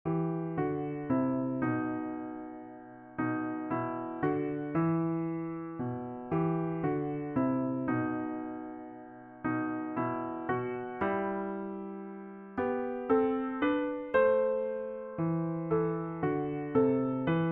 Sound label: Piano